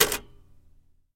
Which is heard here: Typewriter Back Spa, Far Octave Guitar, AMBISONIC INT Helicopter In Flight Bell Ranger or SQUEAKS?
Typewriter Back Spa